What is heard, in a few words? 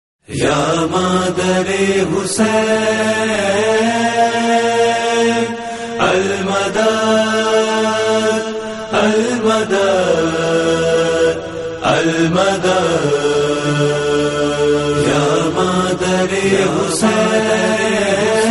Noha; Bibi Fatima; Munajat